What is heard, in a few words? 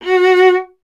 viola F; Miroslav Vitous; Solo Instruments VOL-1